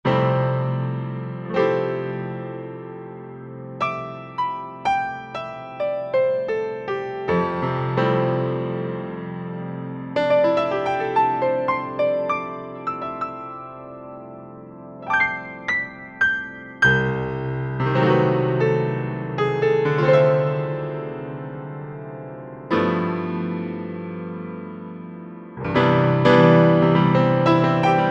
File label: Grand Piano; Guess the synthesizer game